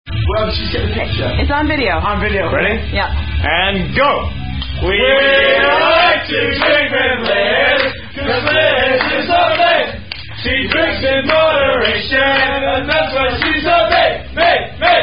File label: Drinking Game With Down-Down Song